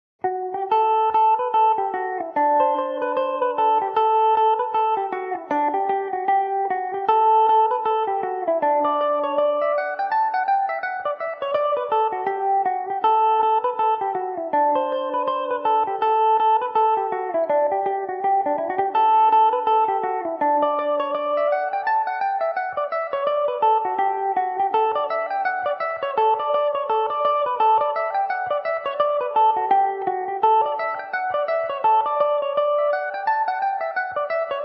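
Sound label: D major revelation, irish mandolin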